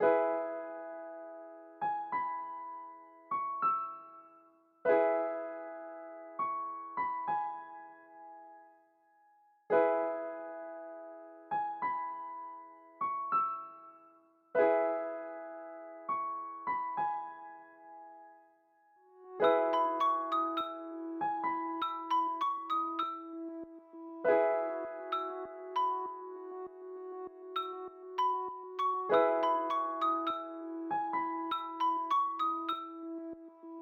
sweet childhood piano; inbento

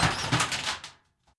Door Capsule End

